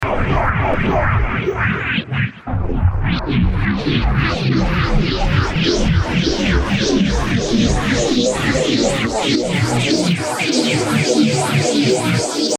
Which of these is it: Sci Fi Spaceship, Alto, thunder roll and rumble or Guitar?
Guitar